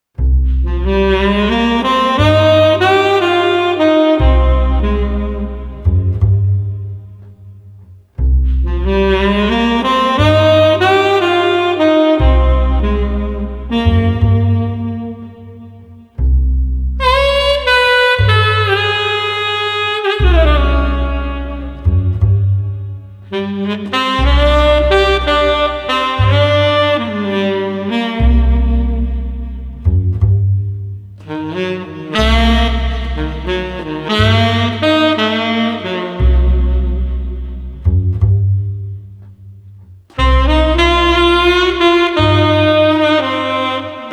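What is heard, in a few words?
Street Sax Bass Inquisitive, 120 bpm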